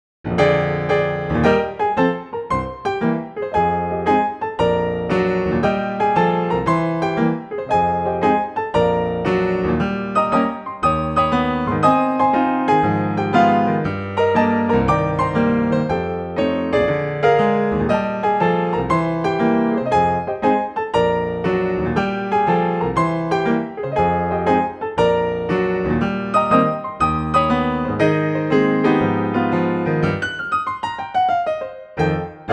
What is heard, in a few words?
Blues, pianoteq bluethner; pianoteq7